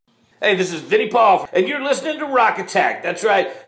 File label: JINGLE